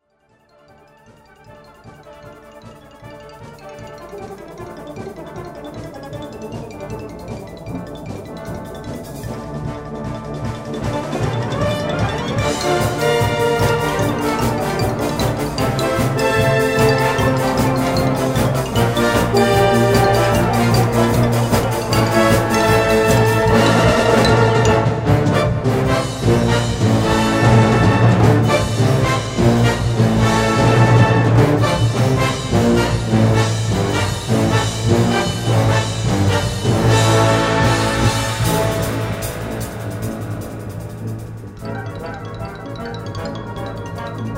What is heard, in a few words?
Toccata in D minor, band